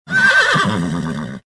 horse neigh; rzhaniya loshadi i konya

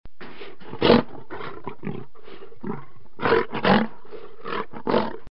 lion growl and snarl; rychaniya lva

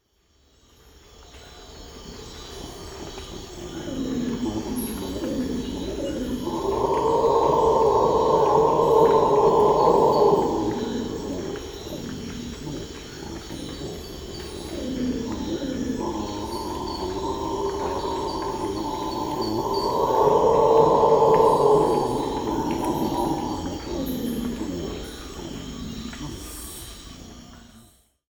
colombian red howler alouatta seniculus; revuna obezyany

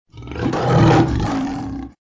lion roar; rychaniya lva

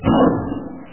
fast tiger roar; tigra

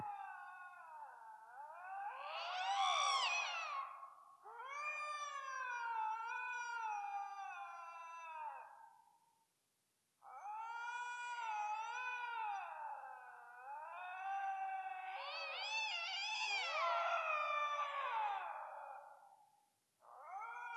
canadian lynx lynx canadensis; myaukanya koshki

